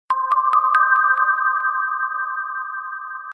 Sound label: Short Echo Sms